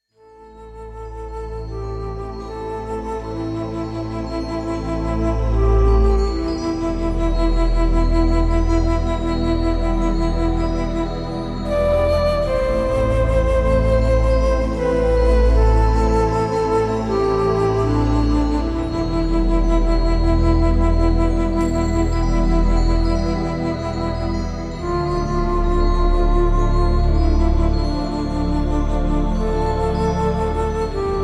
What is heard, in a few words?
morning song